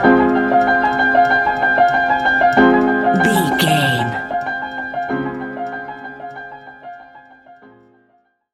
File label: mellow melodies stinger, A relaxed and chilling piece of music